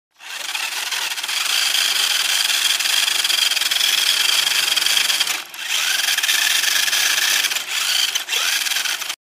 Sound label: remote control car; ماشین کنترلی